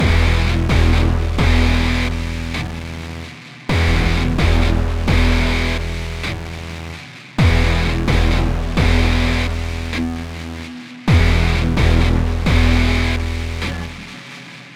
130 Bpm Harsh Rock Guitar Power Chords And 808 Bass